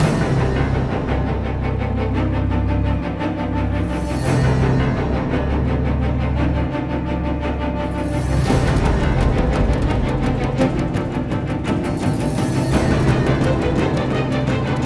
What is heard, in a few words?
String; Assassin's Creed Unity Dead Kings